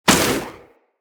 Fire Arrow Impact

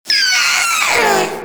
Inked Humanoid Attack, Enemies; Warframe; Shadowgrapher

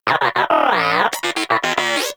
Moa Inquisitive Vocal Agree; Pets; Warframe

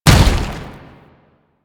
Step, Foley; Enemies; Warframe; Proto Infested; Babau

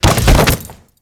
Grineer Light Fall, Body Falls; Warframe

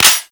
Pistol Mech; Warframe; Weapons; Tenno; Cowgirl Pistol

